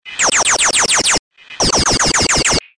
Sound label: Early Video Game Ish Fire